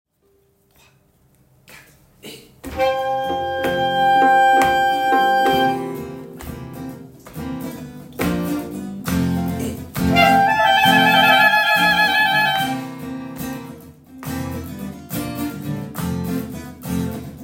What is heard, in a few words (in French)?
Bourree accompagnement, violons